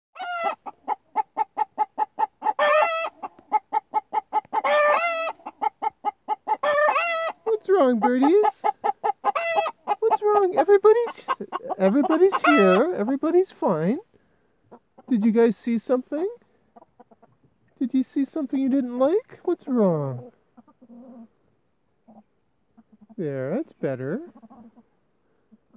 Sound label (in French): cri alarme, poules